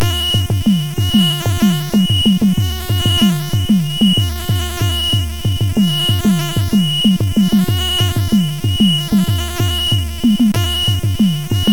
Jungle Sfx, MOS6581R4

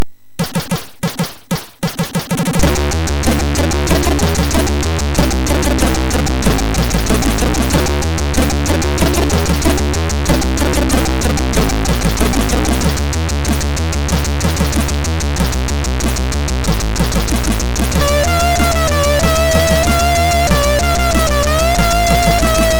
Cool Intro Music, MOS6581R3; hvsc

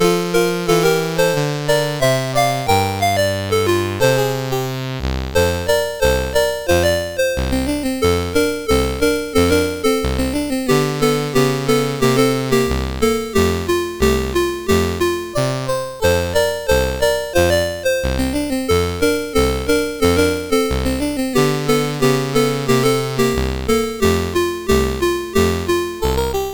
Music, CSG8580R5

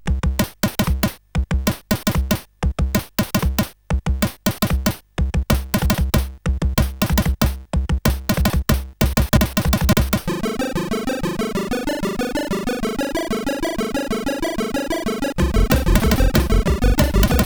Happy Hardcore, CSG8580R5

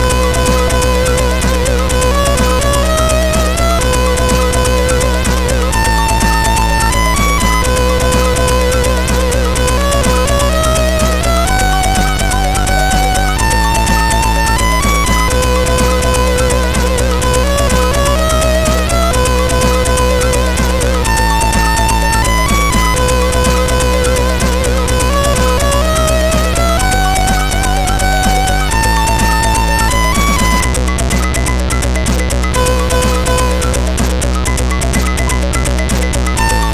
Short Intro, CSG8580R5

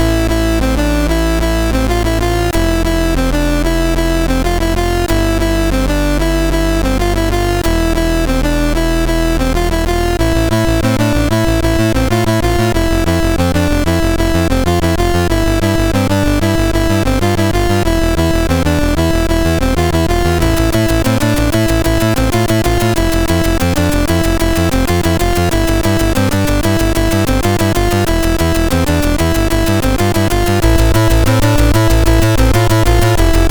Bass Guitar, CSG8580R5

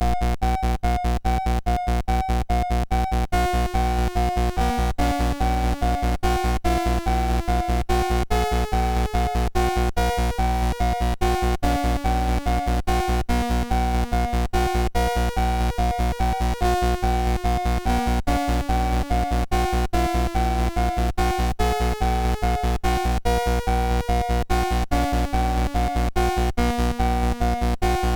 Spooky, sid MOS6581R3; hvsc

